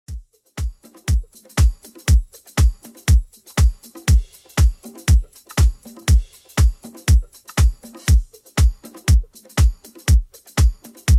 70s Redrum 120bpm

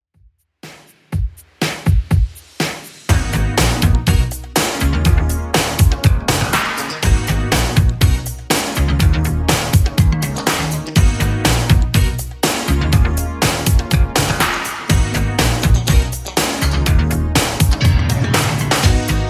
80s Redrum 122 bpm CLEAN

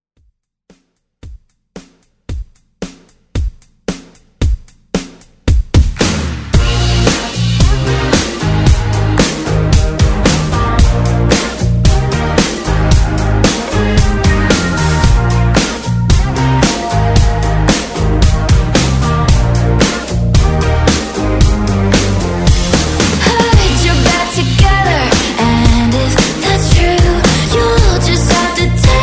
Pop; Pop Redrum

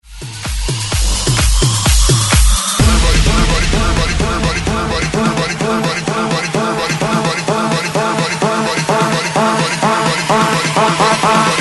128 bpm break mix; DJDOLLS

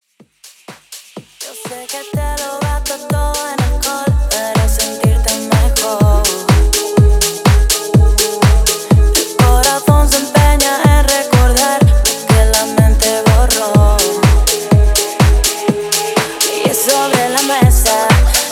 Top40s House ReDrum 124 BPM

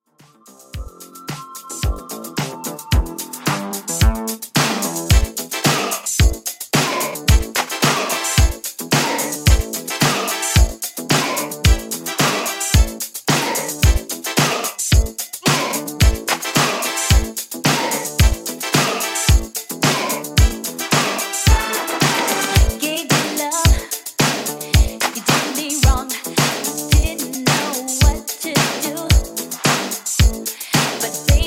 80s 110 Bpm

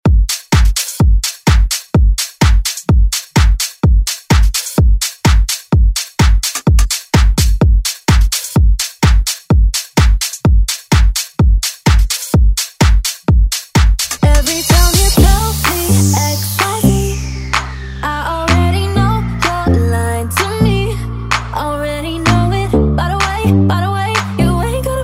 House Redrum

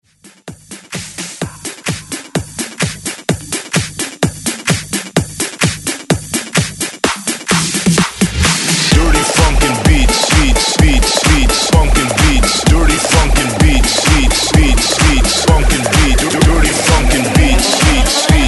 Hype Mash Bounce 128 Bpm

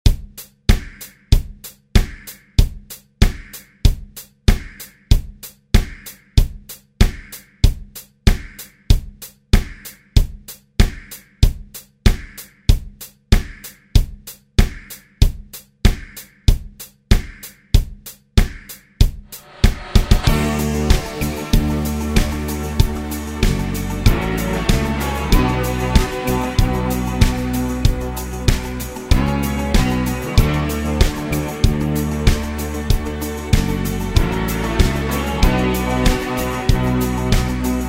80s Re Drum

